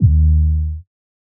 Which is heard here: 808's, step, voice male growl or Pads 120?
808's